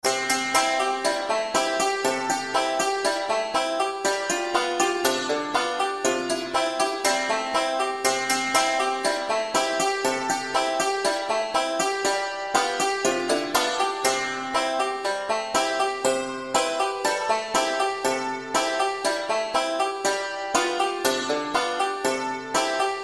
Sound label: banjo pick Buffalo Girls, C; buffalo gals